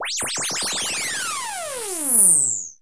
Lazers

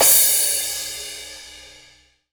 Cymbals Crash; Vengeance essential club sounds-3

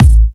Bassdrum; Vengeance Samples

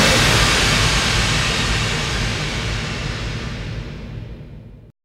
Bassdrums FX, FX Kicks; Vengeance Samples

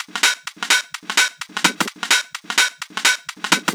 Minimal Loops

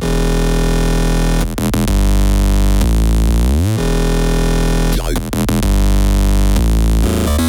128BPM Bass Root E; Zoo; Vengeance Samples; Zoo Kit

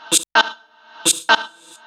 House Loops, Vocal Loops House 128BPM

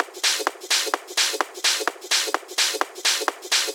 House Loop, 128 BPM; Vengeance Samples

